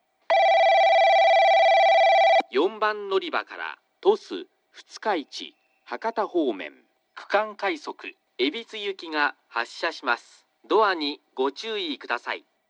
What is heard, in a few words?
kurume regional rapid ebitsu; 4番のりば発車放送（区間快速 海老津行き）